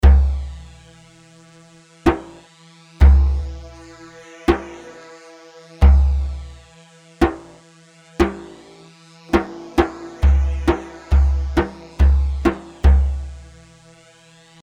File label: Merjaf; Merjaf 6 مرجاف